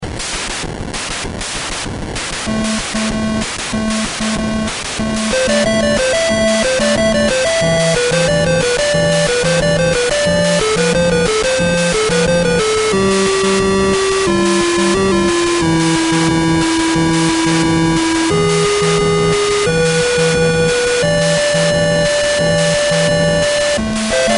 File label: melody